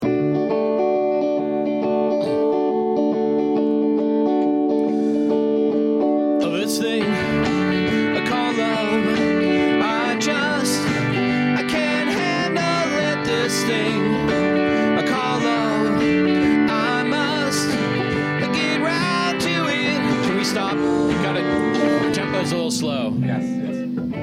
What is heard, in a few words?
Background Noise Mix